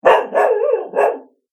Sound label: Dog Angry Barking; Dog Sound Effects; animal sound effects